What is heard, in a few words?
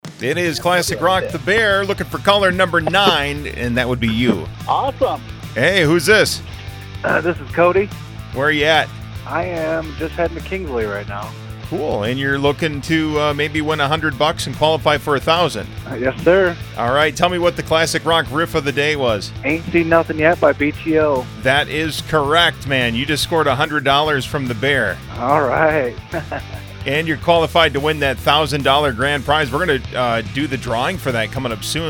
riff